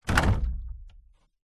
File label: industrial 10 litre plastic drum water heavy set down